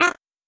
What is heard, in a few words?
Peeper